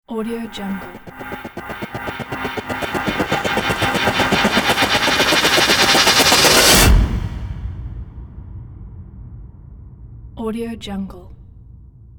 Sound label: dramatic rotation rise